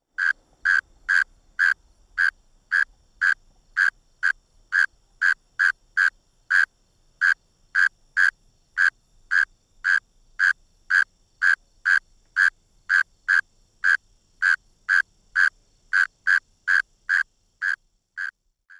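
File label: northern mole cricket male, Northern Illinois Insect Calls; northern mole cricket male2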